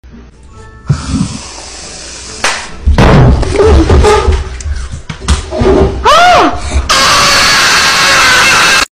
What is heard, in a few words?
ahhhhhh asmr